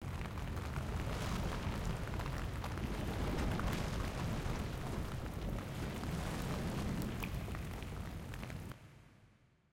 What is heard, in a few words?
courtyard furniture statue